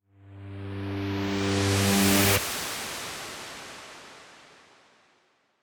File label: final boss riser 8beats; chicory a colorful tale